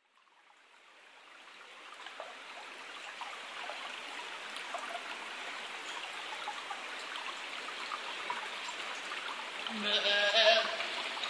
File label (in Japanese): nazokoe